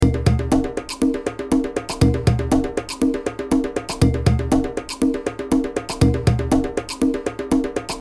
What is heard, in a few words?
Sample loops